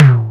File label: SD Tom E Lo, Simmons SDS5